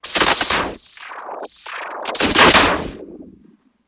Starship 1 Overhead

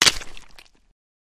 Bone Splatter; gore stabs & eat

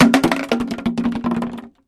Plastic Gallon Jugs

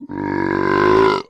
Belches Slow Very Low; belching

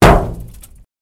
Bullet Impacts Thud, metal

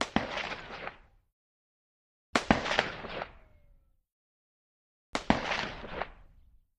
Russian SKS Single, sks 7x6

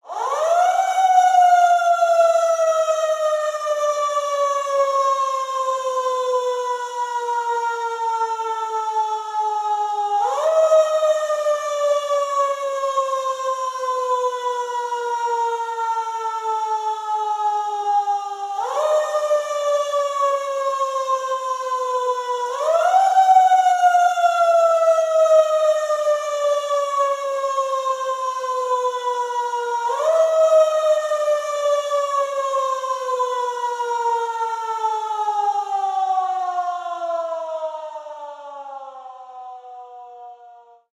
fire engine siren

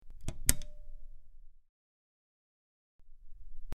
Button Press In Out, switch clicks

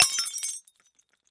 Champagne Glass Cras, kitchenware

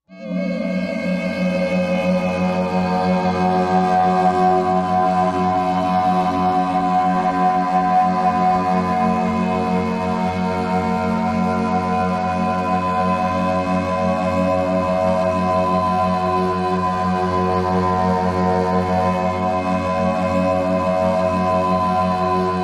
Didgeridoo Constant

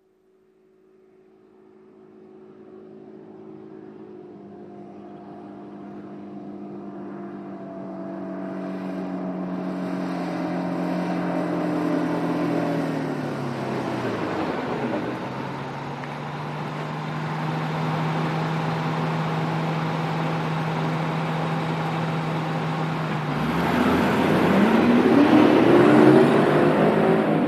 Bus Stp Idl Away

